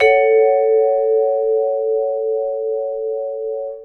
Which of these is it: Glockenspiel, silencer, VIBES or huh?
VIBES